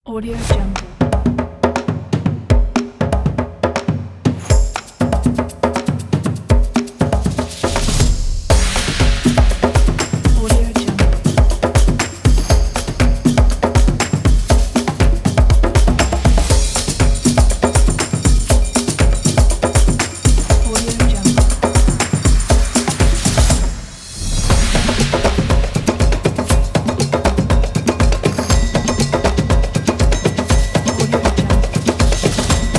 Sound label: african rhythm